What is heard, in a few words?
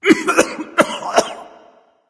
clearthroat; cuchulainn